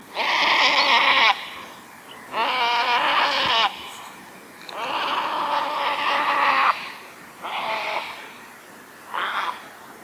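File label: great white egret voice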